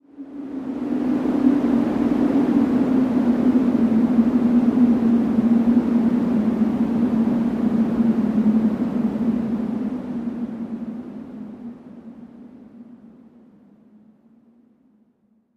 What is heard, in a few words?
wind howl, ambiences